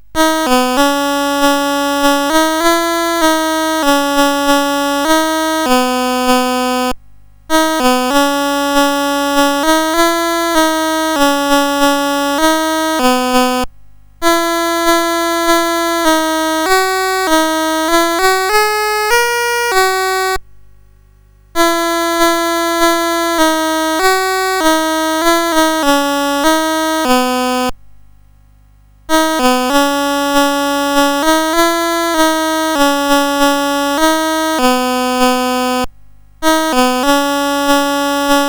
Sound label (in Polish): Choinka, CSG8580R5; Music Shop